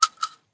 Shell 12ga grass; STALKER; IWP

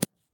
shell 39mm grass; STALKER; IWP

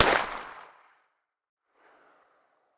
muzzle p220 mono far, weapons

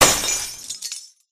glass fall; STALKER; IWP

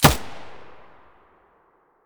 muzzle; S.T.A.L.K.E.R.; IWP; vityaz; pp1901

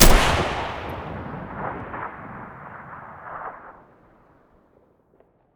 rpk shoot stereo, weapons

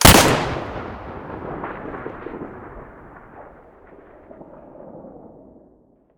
mosin muzzle stereo, weapons

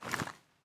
aim out, weapons; STALKER; IWP

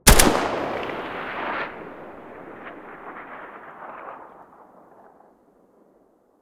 galil muzzle mono

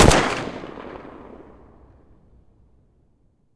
galil muzzle mono distant

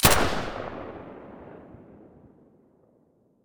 silencer sa80 stereo

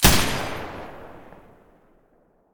silencer M249 stereo

